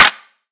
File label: claps, dance drum kit